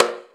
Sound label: JV KIT; Pro-Rec - Synthsonic; JV ANALOG2